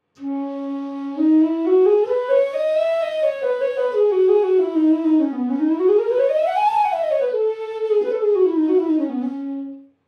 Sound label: presence flute